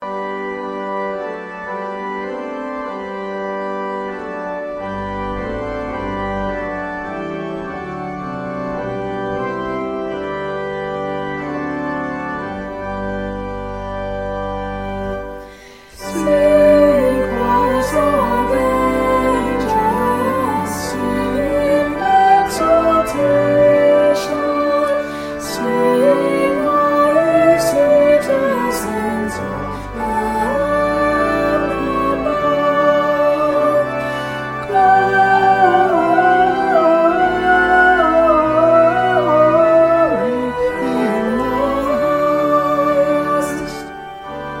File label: Descant